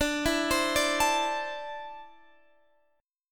D 7th Suspended 2nd down, chord; D7sus2; arpeggiated

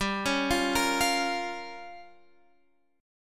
G Minor 7th Flat 5th, Guitar, Half Step Down

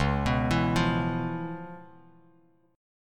Db 7th Sharp 9th Db, down, Bass Standard; Db7#9 arpeggiated; JGuitar

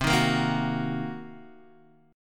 C Minor 7th Flat 5th Guitar Open D, chord

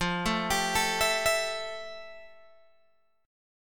F Major 9th F, Guitar, Open G

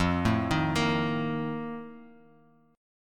F 11th F, down, chord; F11 arpeggiated

